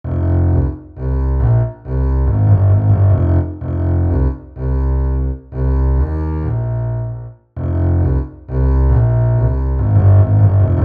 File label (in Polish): Kontrabas